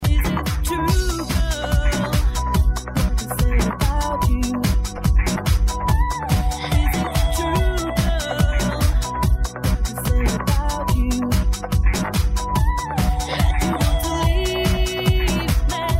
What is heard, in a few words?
trance girl